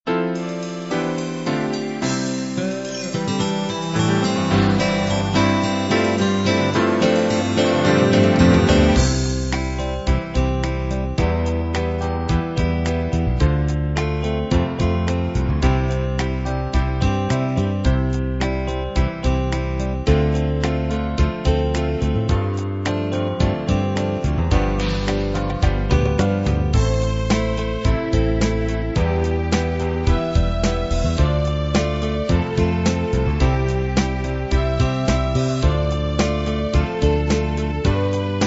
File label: love 8beat combined